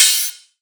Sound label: dampened open hi hat one shot a sharp key, open hi hat one shot